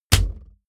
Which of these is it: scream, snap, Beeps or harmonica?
snap